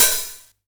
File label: 909 OHH Tape; Goldbaby Tape808 & Tape909; The Tape 909